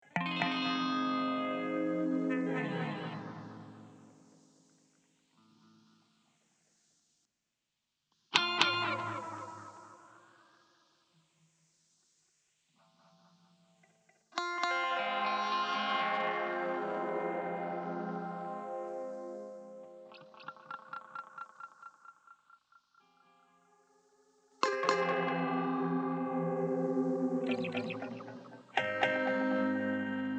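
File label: breakdown guitar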